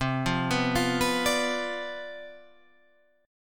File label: C Major 7th Suspended 2nd Suspended 4th C down, chord